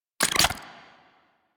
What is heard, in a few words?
Briefcase Launcher Rearm; Warframe; Weapons; Corpus